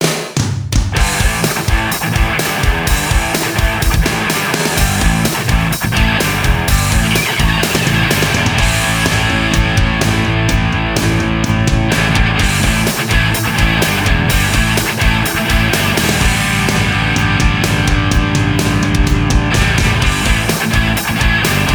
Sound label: Music rock box; Harley Davidson Motorcycles Race to the Rally